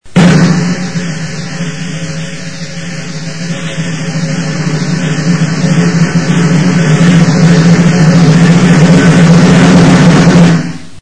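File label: drum roll noise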